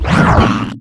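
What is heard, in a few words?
alien hit; combat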